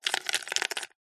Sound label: coffee pods in plastic container movements handling; food drink coffee nespresso